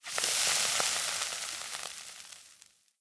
Cook; blue archive

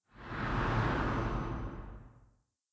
Vanish; blue archive

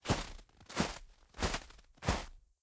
Snow Foot Step; blue archive

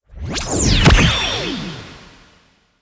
Gacha Normal; blue archive

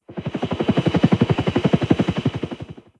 Helicopter; blue archive

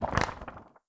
Book; blue archive

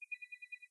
UI Pad Loading; blue archive

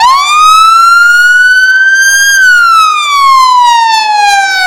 wail, sirens; whelen cencom sapphire

